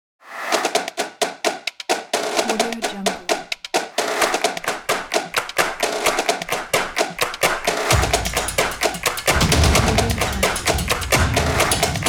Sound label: drums claps build up